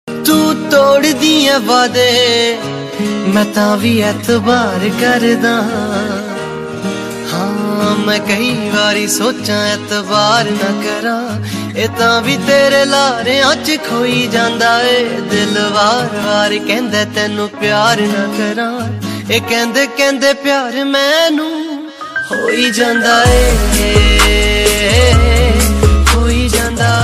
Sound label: Punjabi sad ringtone